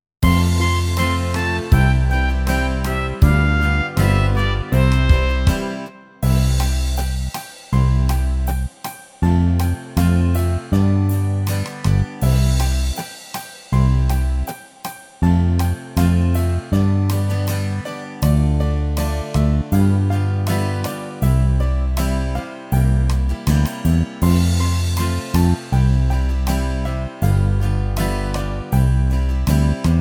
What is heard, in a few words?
Karaoke